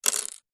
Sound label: leisure lego piece single set down on wood table